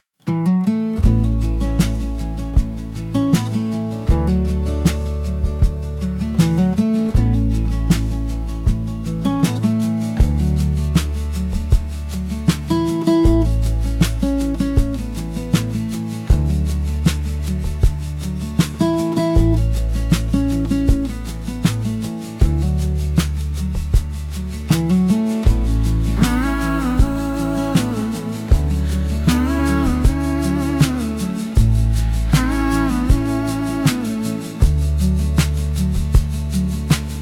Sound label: acoustic chill for gentle sunsets